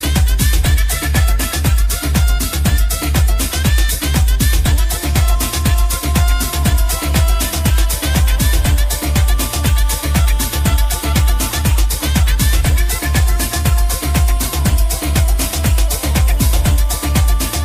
rajasthani musical ringtone

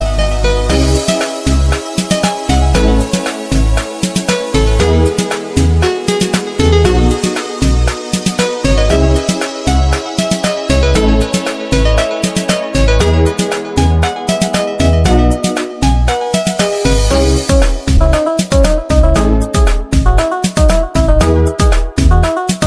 beautiful moroccan ringtone

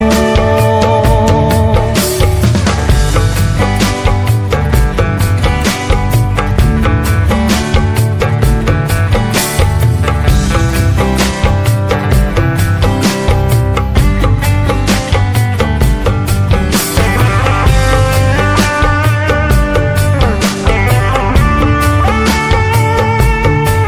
music best ringtone

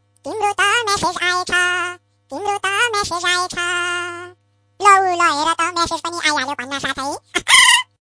nepali message remix

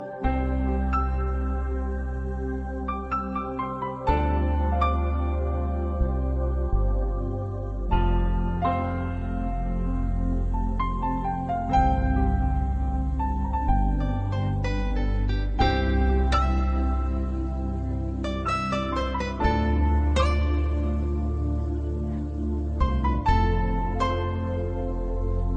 piano, ringtone

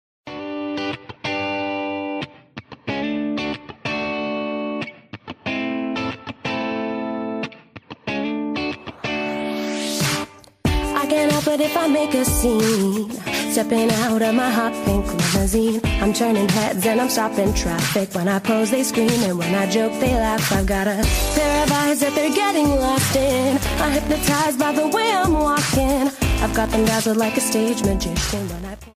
soft music ringtone

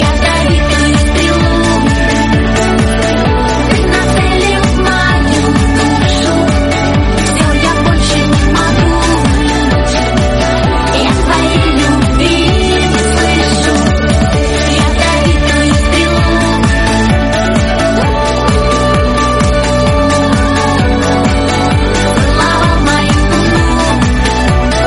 russian techno

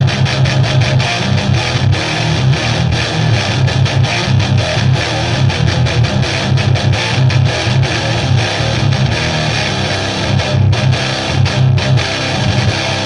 new guitar; ringtone

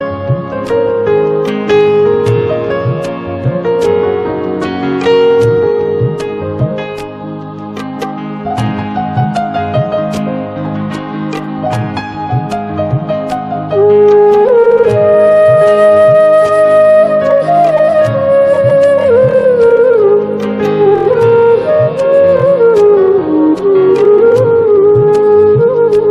best soft flute ringtone

